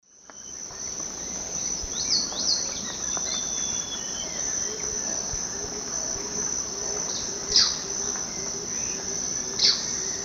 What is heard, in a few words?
Myrmotherula sunensis, song and call; S. America; Eastern Lowlands